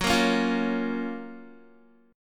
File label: Gb Major 7th Gb Guitar